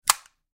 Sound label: reload